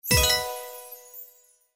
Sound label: Symbol Regular Win; Premier Night